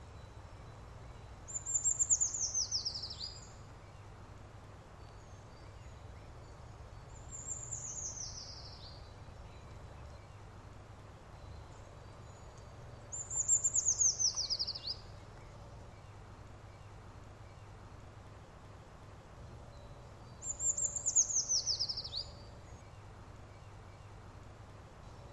puukiipija; Nadja Weisshaupt

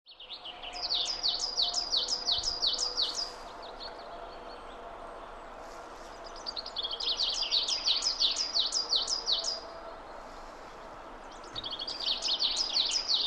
kuusitiainen

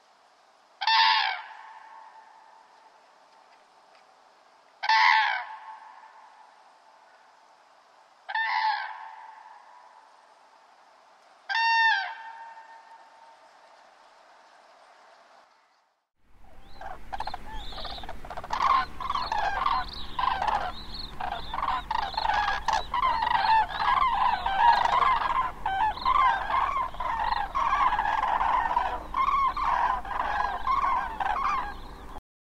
kurki

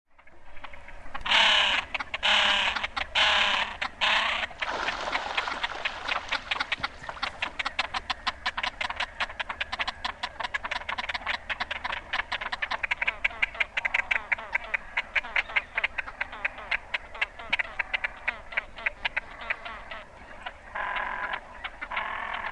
silkkiuikku

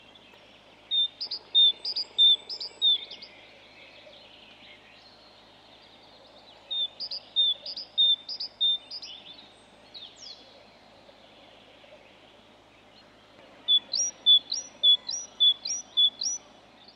talitiainen

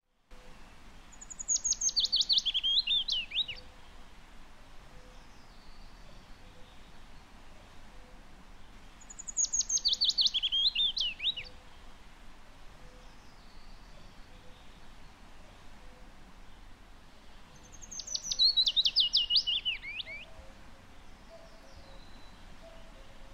pajulintu

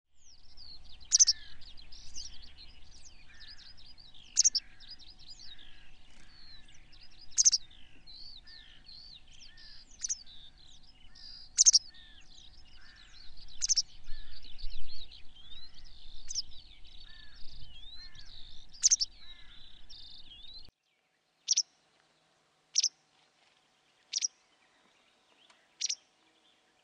vastarakki

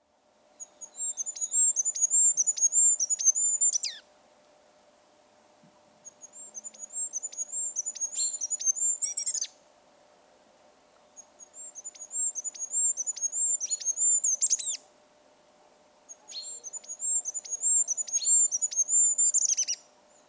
hippiainen

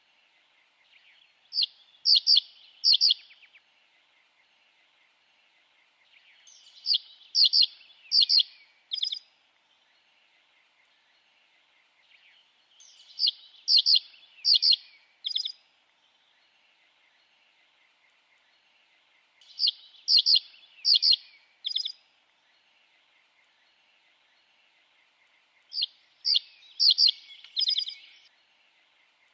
pajusirkku

